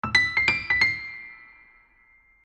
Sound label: piano flourish